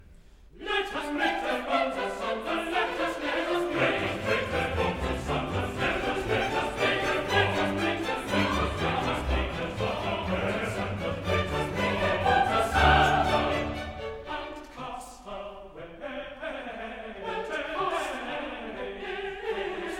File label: let us break their bonds asunder, chorus